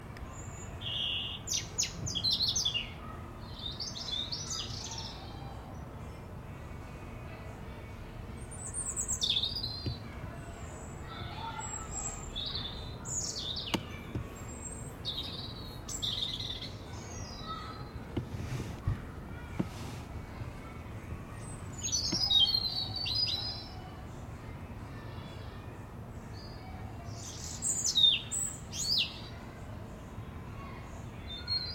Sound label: birdsong and children playing recorded in my garden on a weekday afternoon